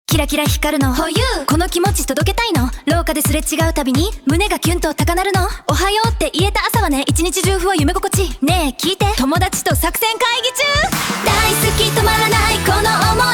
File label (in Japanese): 女性ボーカルのアイドルソング; Lyria 3; Gemini